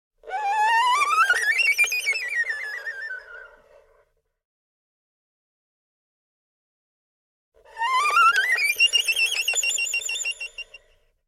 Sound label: ErHu Playing Techniques Interval A Med; 揭秘：我们是如何设计中国民乐音效库的